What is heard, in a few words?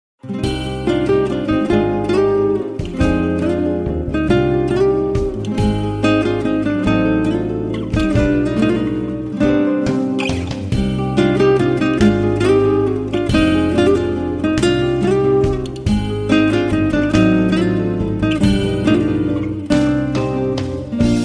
Ney